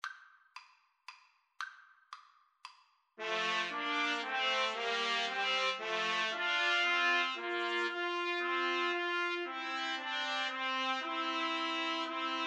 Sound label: Trumpet Trio